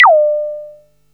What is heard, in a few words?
bleeper; Best Service Alpha Dance vol.2